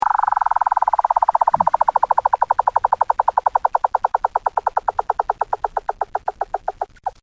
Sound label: weddell ringtone, weddell seal